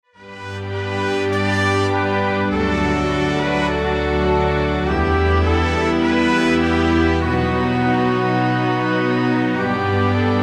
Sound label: instrumental; The Sanctus Holy Holy